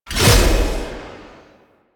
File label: Impale Cast Release; Warframe; Warframes; Hoplite